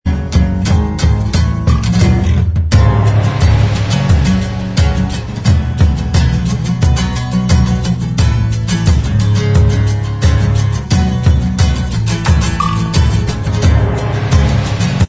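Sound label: acoustic version instrumental track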